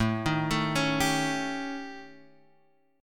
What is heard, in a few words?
A 7th Flat 5th Guitar Half Step Down